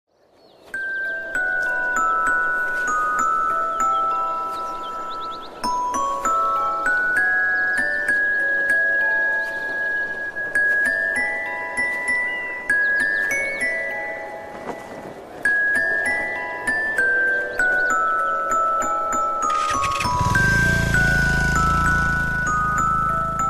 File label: india national ringtone; indepeance day